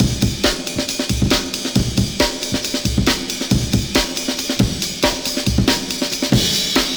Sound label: classic amen; djflack; beatresearch